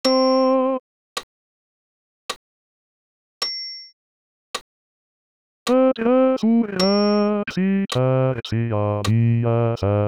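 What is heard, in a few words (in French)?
Credo basse voix seul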